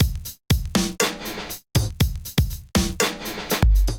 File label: Kit, Drums Hybrid; Muttkaster Kit; Ableton; Factory Packs; Drum Essentials